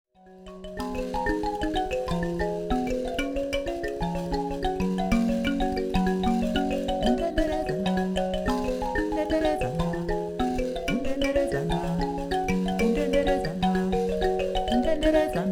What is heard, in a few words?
mbira